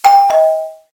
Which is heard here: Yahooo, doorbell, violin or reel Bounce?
doorbell